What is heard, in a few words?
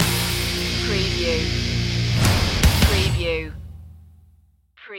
firestorm stinger